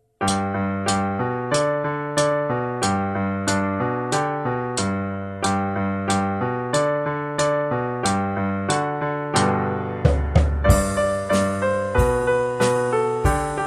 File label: drumming sessions